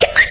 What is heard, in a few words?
hiccup